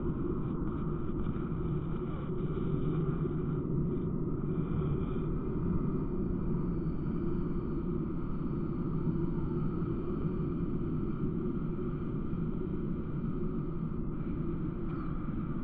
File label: amb eye boss